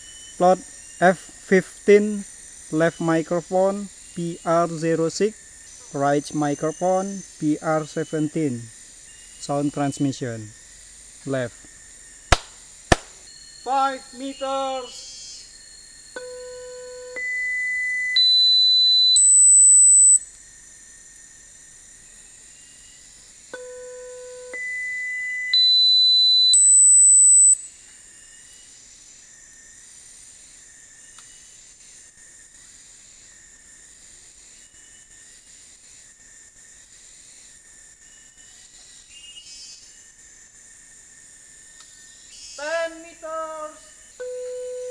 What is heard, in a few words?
birds